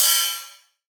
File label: House Ride, Cymbals; Drums; One Shots